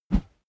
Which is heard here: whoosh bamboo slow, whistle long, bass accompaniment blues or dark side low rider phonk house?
whoosh bamboo slow